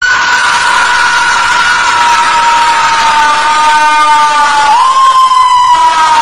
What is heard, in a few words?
hell scream